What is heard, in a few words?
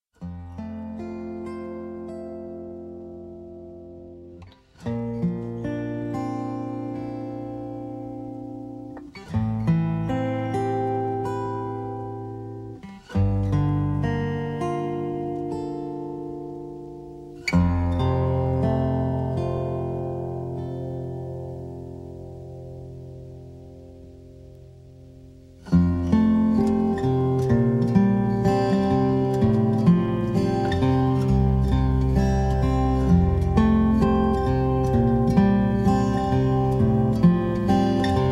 Instrumental